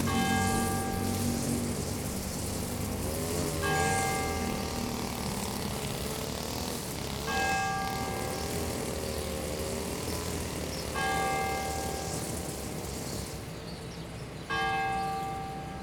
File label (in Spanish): plaza central campanas parroquia cortadora pasto, galvez; santa margarita de escocia